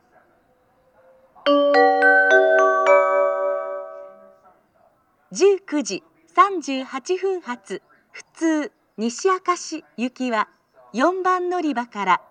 amagasaki local nishi akashi; jr-west

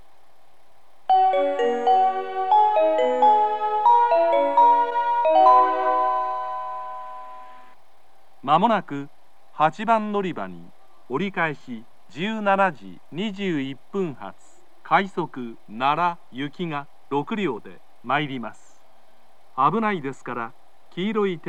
turn rapid, kyoto; nara

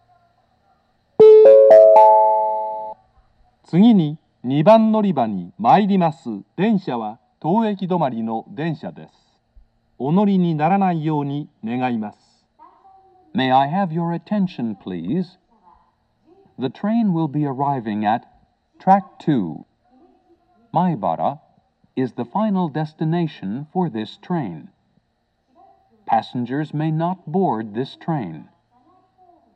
maibara stop; station

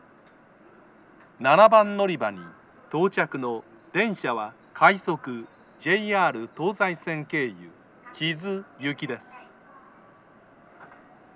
amagasaki rapid, station; kizu; jr-west

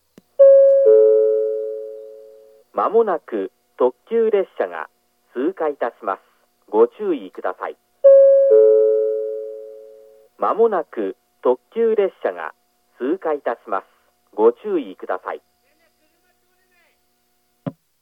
up pass limited express; midoriko; jr-east

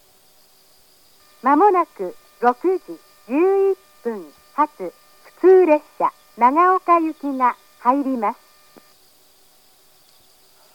echigo ishiyama, station; jr-east; nagaoka

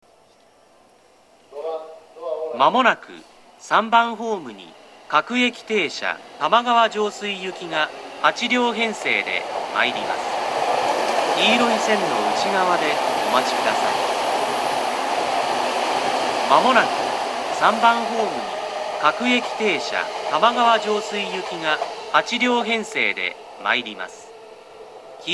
takadanobaba local; tamagawa-josui; station

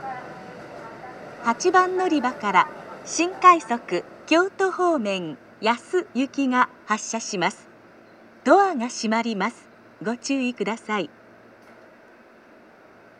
special rapid service, jr-west amagasaki station; yasu

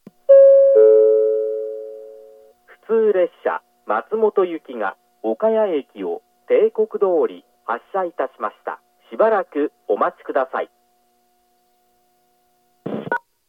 up local; midoriko; matsumoto; jr-east

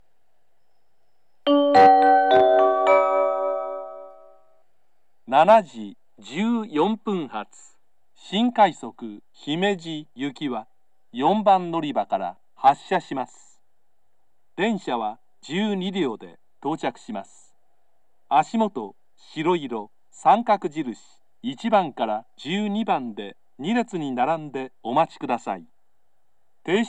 sannomiya special rapid service; himeji

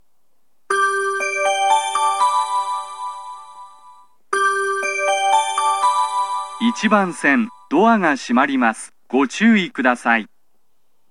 hanasaki, tobu station

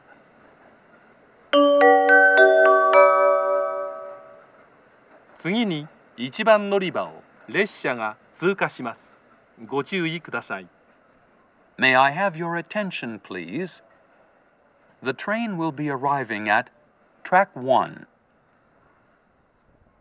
amagasaki pass, station; jr-west

